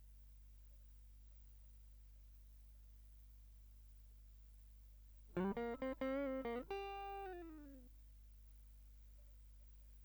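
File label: Guitar Line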